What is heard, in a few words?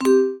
Bet Increment; Wu Xing; genesis